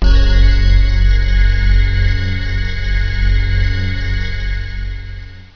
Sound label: logo sting